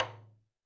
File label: Roland TD-20 Percussion